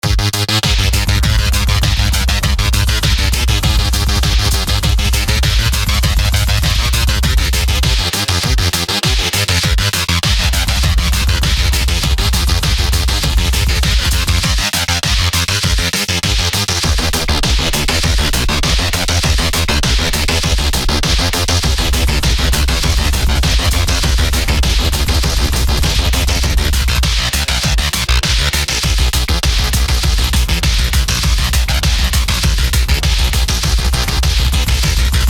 Jungle

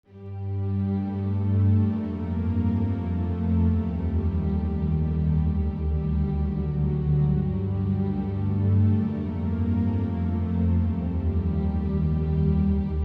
Progressive trance

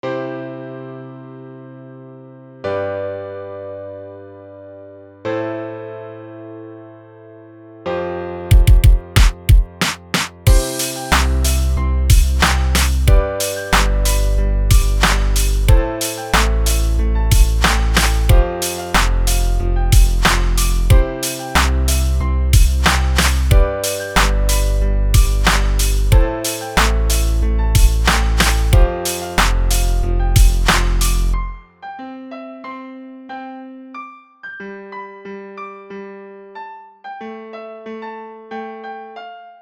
Beat & Piano

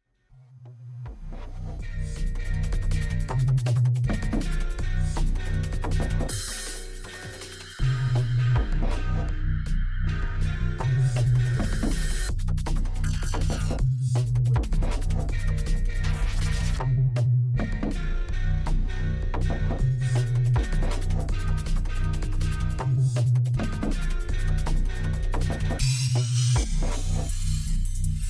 Drum And Bass; Open Fire Short; Mississimonetta Label

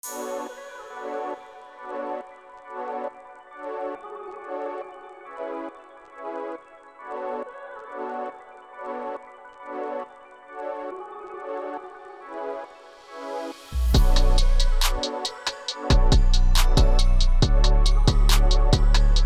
Chill beat

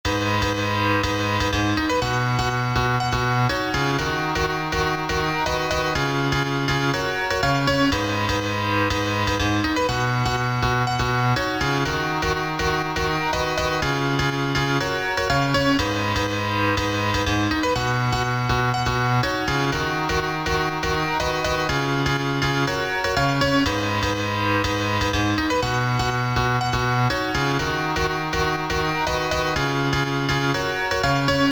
Piano Soul

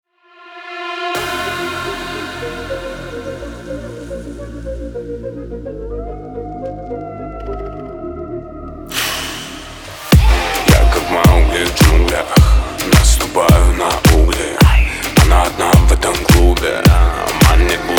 ElectroHouse Remix

